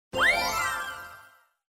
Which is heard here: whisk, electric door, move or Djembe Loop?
move